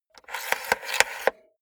cassette player antenna extend fast

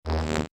future buzzing elements layer

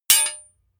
hammer hit metal hard

